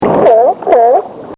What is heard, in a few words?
Seal; Animals; recorded in a custom built sound booth